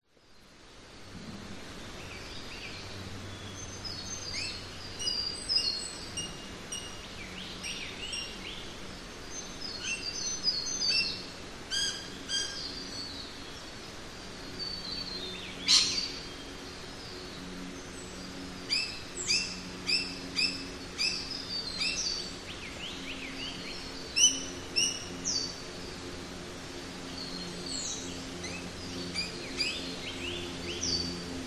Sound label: nature forest birds wind trees leaves fall prop plane fly overhead